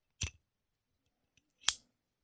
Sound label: Click Matawan